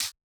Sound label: hit light; battle; CrossCode